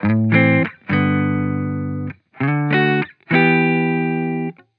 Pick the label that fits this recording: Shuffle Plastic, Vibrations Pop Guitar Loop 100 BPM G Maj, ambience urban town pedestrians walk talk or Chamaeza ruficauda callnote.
Vibrations Pop Guitar Loop 100 BPM G Maj